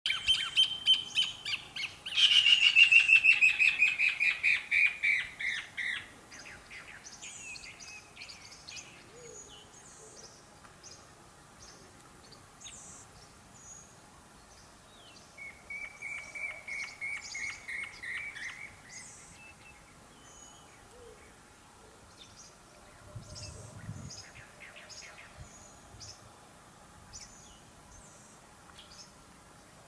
Los Chilalos; Chilala; Lambayeque

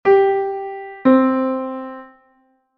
5ª Justa Descendente Sol3 Do3; intervalos musicales; ¿Qué intervalo suena?

